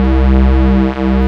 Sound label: BAS, Eurotech; Sounds Good